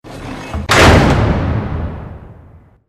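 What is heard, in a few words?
Door Metal Slam Belltower Behind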